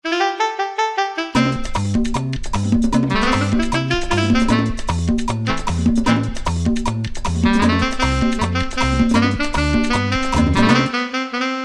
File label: Merenge, Alesis Z7 Latin